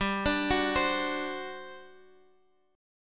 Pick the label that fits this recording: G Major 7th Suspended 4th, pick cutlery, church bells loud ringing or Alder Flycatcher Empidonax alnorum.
G Major 7th Suspended 4th